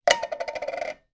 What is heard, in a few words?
foley food tin can empty down on wooden surface